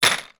industrial drywall screws put in empty plastic box